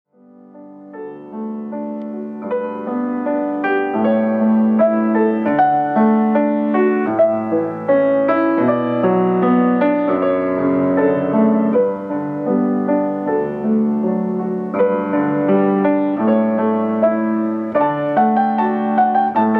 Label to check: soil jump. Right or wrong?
Wrong